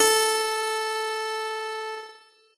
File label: piano; pianojs